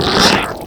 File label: barnacle bark; hl2